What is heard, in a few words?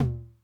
Tom Mid Accent Mid Tone Max Decay, 909